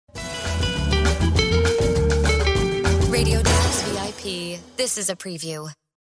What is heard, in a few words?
Music Bed